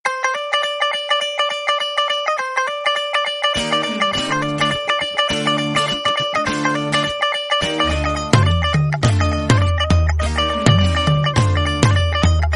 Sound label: punjabi tumbi